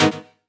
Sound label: Synth Shot G#; Mutekki Media; Vengeance Electroshock Vol.2